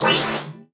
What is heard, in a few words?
Collectibles Various FX; Cymatics; Video Game Sample Pack Vol 1